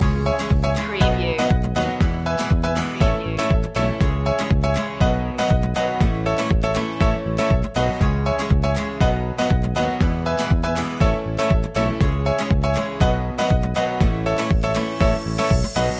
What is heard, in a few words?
guitar loop; media music now